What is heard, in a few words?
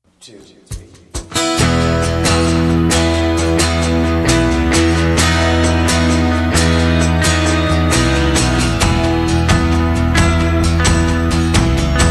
Acoustic Version